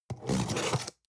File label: cutter cardboard open